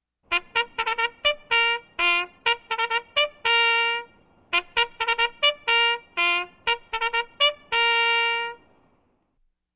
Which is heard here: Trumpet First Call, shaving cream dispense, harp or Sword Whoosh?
Trumpet First Call